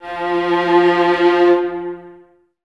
String Ensembles, Violas, VLS Detache

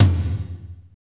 TOM, Power Ballad Toms; Northstar; Drumscapes Roland

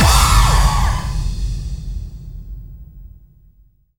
BD-FX, Dance Mega Drums; Best Service

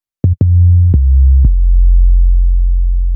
Bass, Hip-Hop

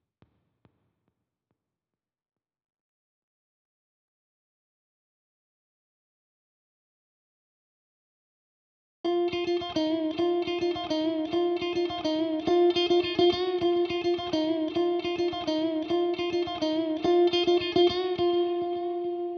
Lead, 105BPM; 99Sounds; Monosounds; Music Loops; PANDORA BOX!; Nomde

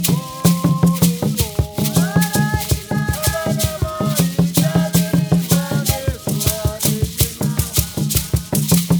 REJOICING; Spectrasonics; Heart of Africa 2

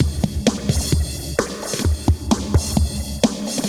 Moog Phaser HR-16B (130BPM), cyborg beats

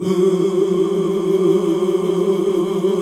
Gosp. Oooh

